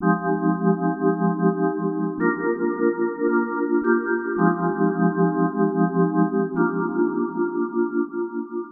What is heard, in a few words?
Vibe Pad, Funk; Dr. Fink's Funk Factory